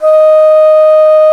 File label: Tenor Bansuri D#4; Planet Earth; Digital Sound Factory Planet Earth